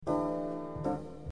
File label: Chord